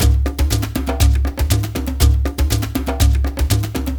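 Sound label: Djembe; Spectrasonics; Heart of Africa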